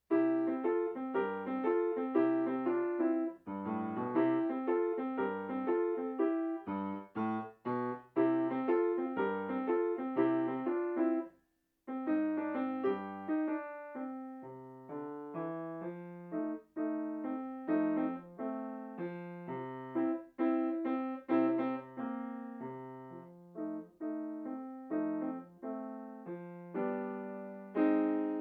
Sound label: blues